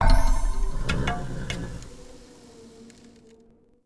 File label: Build Persian Immortal; Civ4 warlords